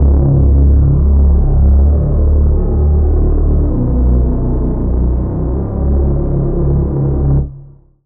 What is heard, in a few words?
Reese